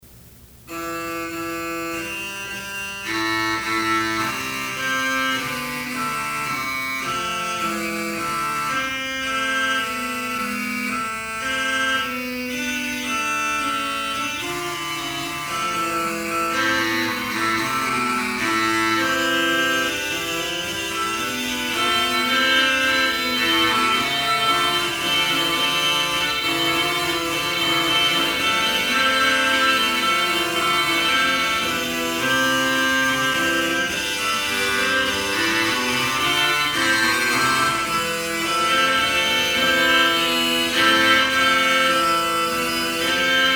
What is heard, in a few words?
Keyboard hymn; anonymous polish